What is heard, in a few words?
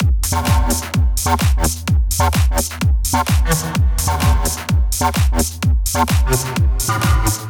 Full Loop